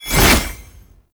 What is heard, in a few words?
Gunbrella Aim Transition; Weapons; Warframe; Tenno; Koumei